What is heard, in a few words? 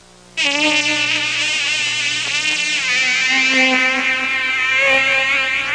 Mosquito swarm